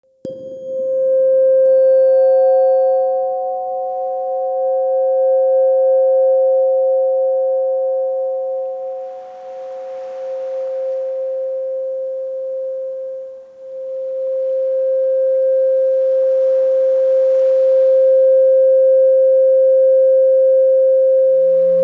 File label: Solfeggio Tuning Fork Meditation